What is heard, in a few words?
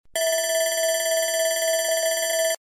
alarm